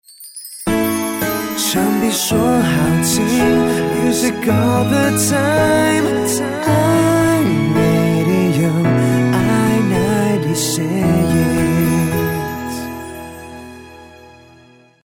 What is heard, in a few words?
lyric Jingle; iradio